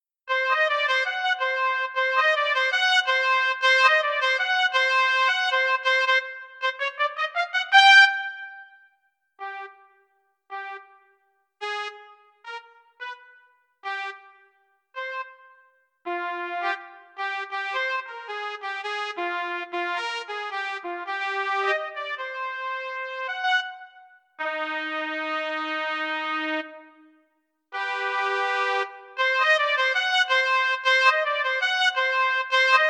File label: trumpet; holiday brass